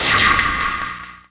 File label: fury Start; Feeding Frenzy